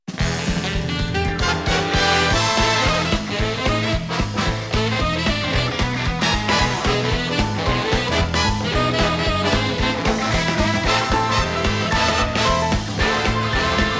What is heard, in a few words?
Tango; Donkey Kong Bananza Breaking Through Heart of Gold; Bonus Stage